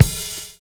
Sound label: BRUSHING; Univers Sons